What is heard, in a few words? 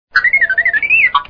R2D2; Star wars; Notificaciones